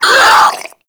Infested Crawler Pain; Warframe

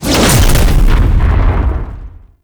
Whip Ranged Attack Proj Explosion; Enemies; Weapons; Warframe; The New War; Archon; Nira

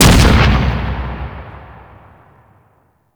Impact Ground Slam, Weapons; Warframe; Furax

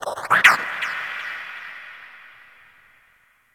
Cephalon Tech Texture Medium, Ambience

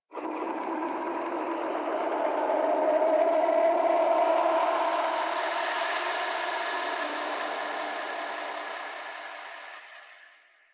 Landscape Wind Atmosphere Anomaly, Ambience; Warframe; Venus Open World; One Offs

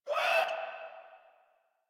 Infested Runner Pain; Warframe; Runners

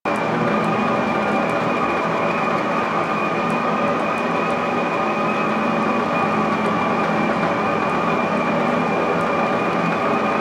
Air Vent Loop; Ambience; Props; Warframe; NineteenNinetyNine

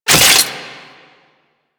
Necramech Repair Drone Idle Short; Enemies; Warframe

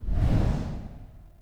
Spike Trap Whoosh; Objects; Ode Lantern Crate

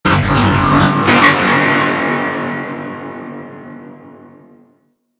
Creature Robotic; Ambience; Warframe; Corpus Outpost

